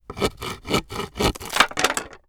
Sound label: profession woodworking crafting saw break; empire game; splinter tool